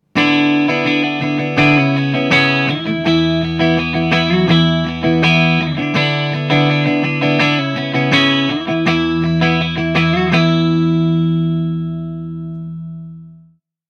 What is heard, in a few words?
Soft Rock, ringtones; Marshall; London KB 1501